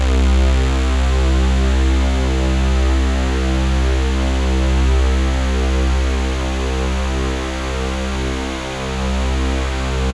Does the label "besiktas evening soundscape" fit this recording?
No